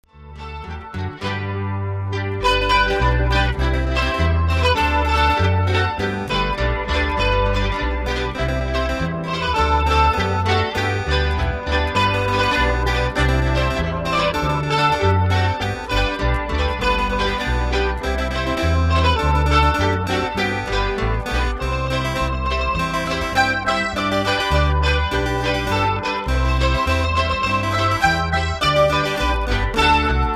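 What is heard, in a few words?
loop, posaune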